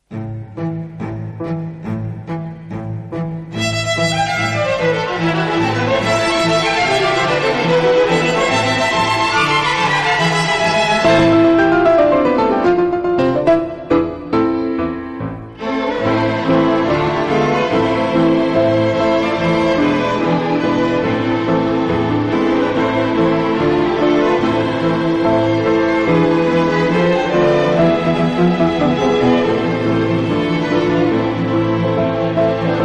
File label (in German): Musik; СЦ ЕХБ